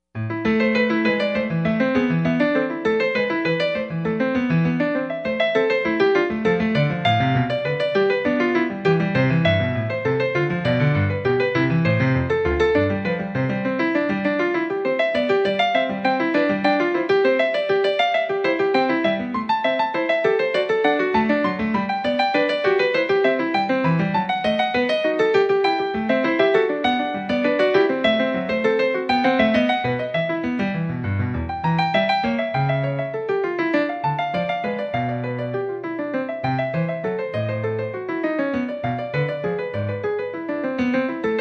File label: Two Voice Invention